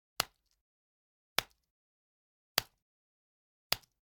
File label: Generic Button Plastic Tiny